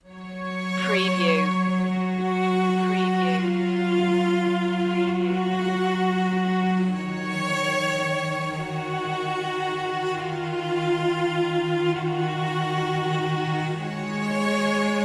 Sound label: with cello